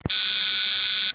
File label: RCX Low Beep